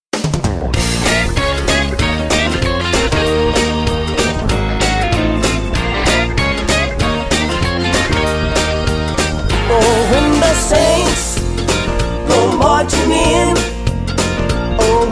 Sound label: triumphant melody